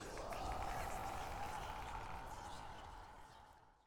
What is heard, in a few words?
intro charred forest bloomed; lol